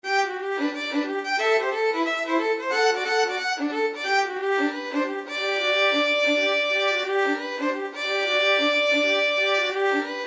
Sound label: when the saints, violin duet